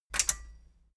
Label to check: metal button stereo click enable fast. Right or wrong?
Right